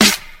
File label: smooth steel snare drum sound G sharp key